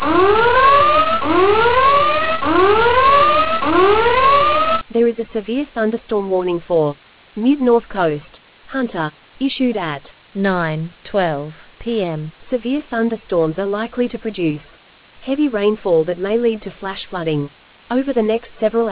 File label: storm audio